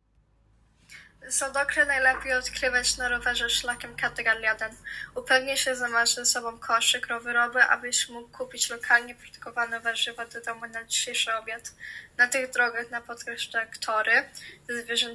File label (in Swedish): polska; Södåkra